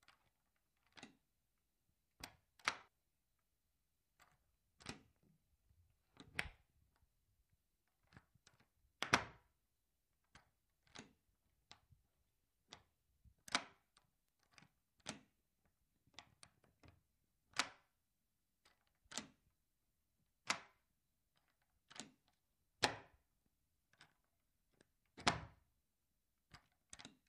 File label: Deadbolt Lock, latches